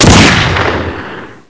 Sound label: desert eagle fire, weapons